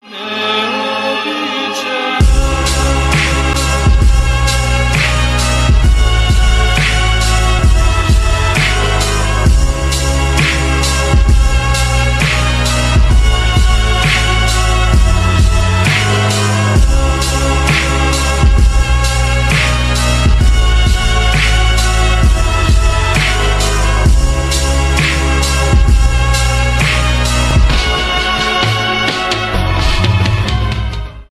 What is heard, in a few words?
Slowed & Reverb; Ремиксы; Тик Ток